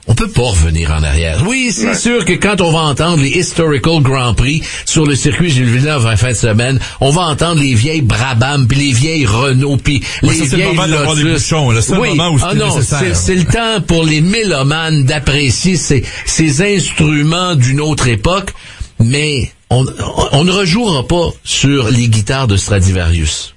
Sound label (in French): guitare stradivarius